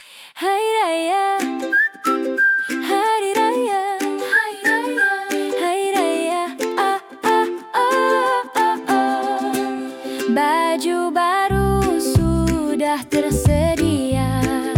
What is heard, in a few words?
song